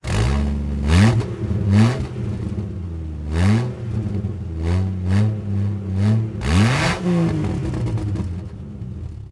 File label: Far motorcycle; anemoi